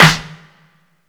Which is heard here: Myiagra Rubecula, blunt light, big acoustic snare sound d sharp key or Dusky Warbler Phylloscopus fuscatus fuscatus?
big acoustic snare sound d sharp key